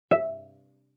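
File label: Cello pizz fortissimo sul A E4; MIS Pitches - 2014; Strings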